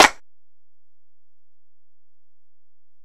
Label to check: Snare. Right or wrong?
Right